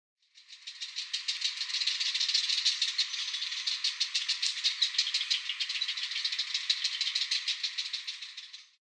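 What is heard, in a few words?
Striated Heron; utsunomiya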